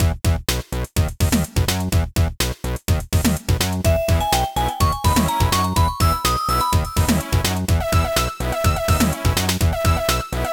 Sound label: BGM MIDDLE; BGM MIDDLEDEMO